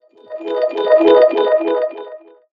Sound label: Notification